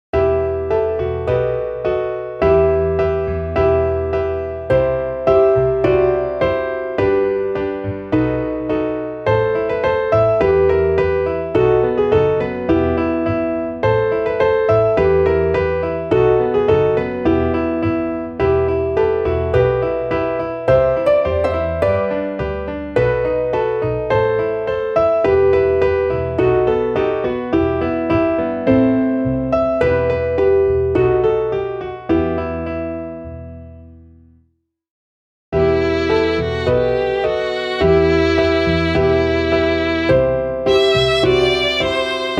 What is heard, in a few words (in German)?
klavier; Maruschka und Majenka; Komm, Maruschka, tanze ! [russische Fassung]